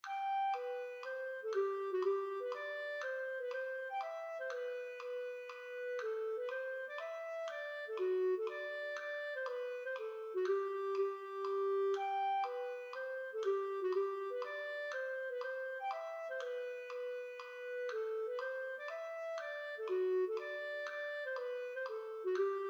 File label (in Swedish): Hambopolska; Wigers